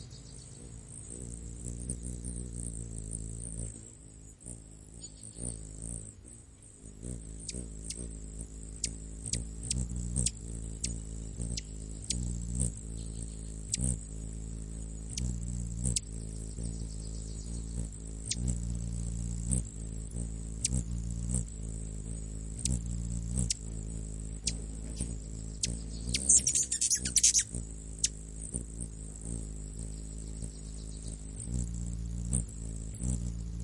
kolibri

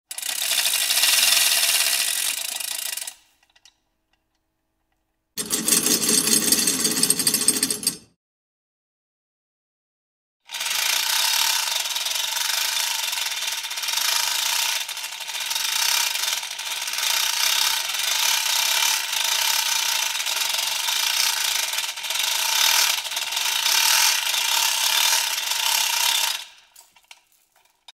tsepnye podiemn mehanizmy

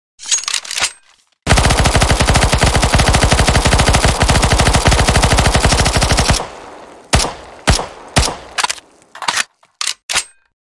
pp bizon, zvuki opuzhyia

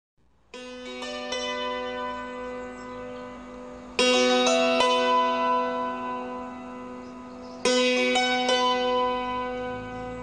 cymbaly

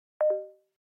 razriajennaia batar; samsung 6.0

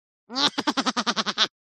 smeh multyashnyi

